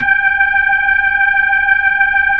B-3 Chorus

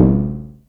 SYNCUSSION

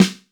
JAZZ S, JAZZ KITS; Crypton; DrumBase5000

